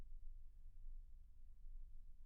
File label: Children Choir; Studio Bekol Children Choir